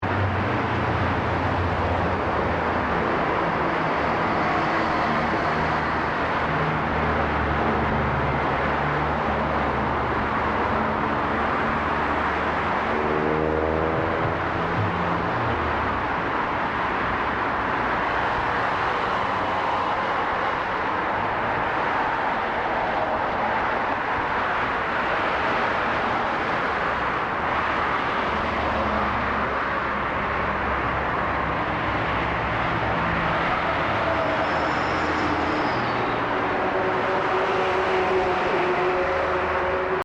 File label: main road, sources of noise; Listen to sounds from near a main road; 2007 noise surveys